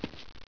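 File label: player step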